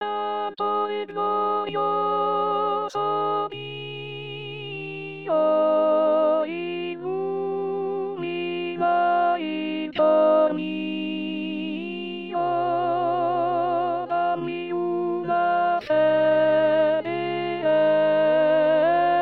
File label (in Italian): Contralti